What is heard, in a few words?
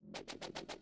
Chorus Bass FX, pop rap